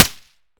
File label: fire sup, m16; Urban-Coalition; Decay; arccw ud